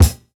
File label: Kick, jungle breaks drum sounds; ORIGINAL JUNGLE BREAKS